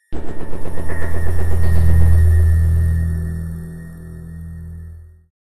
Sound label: Horror